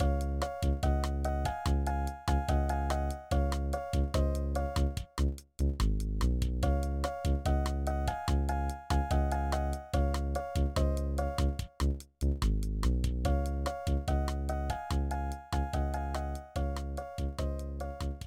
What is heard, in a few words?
BGM Menu; Gakken Mainichi no Drill DS Mezase Miracle Shougaku 1 Nensei; NDS